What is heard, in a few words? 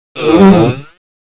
pain; vortex; phantom